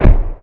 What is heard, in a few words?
combat atmosphere; World of Tanks